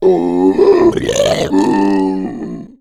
male boomer lurk; voice, idle

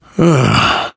negative noise, survivor voice, biker

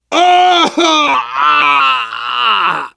hurt critical

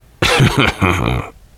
laughter, survivor voice biker

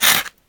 claw scrape

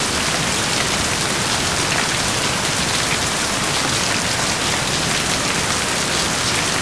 surfacerain med loop, rainscapes

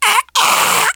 choke, survivor voice; manager; Left 4 Dead 2; left4dead2 dlc3

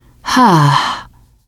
pain relief sigh, teengirl; player; survivor; voice

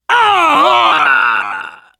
hurt critical

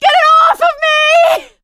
scream while pounced, survivor voice; producer

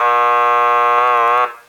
low score

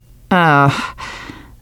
negative noise, teengirl; player; survivor; voice

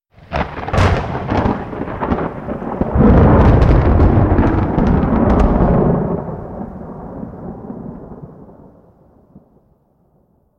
lightning strike, thunderstorm; weather

